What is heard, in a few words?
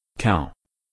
cow